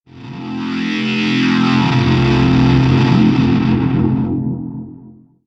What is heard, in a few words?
Riser